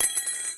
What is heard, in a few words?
join; TTCom